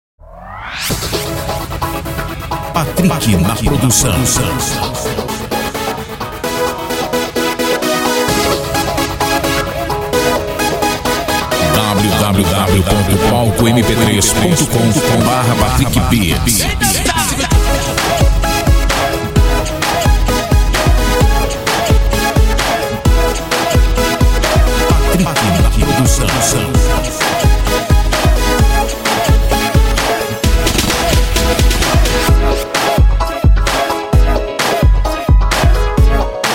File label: beat funk melody